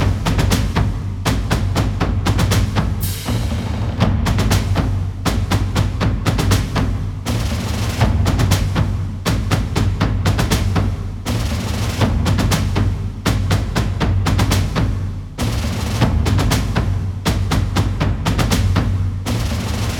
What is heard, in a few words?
TENSION LOOPABLE